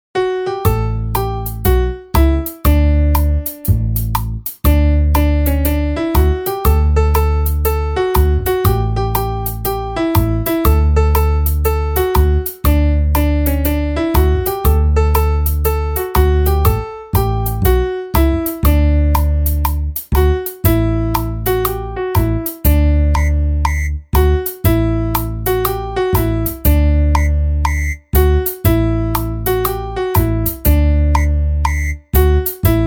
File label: backing